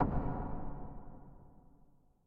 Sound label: paytable Snd; netent; dracula